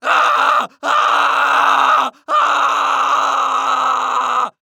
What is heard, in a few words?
Human Male Yell Multiple Frustrated Yell